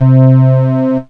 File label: Yamaha PSS-170 Electronic Organ 2 C3